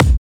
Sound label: Dnb Kick Neurofunk; Dabromusic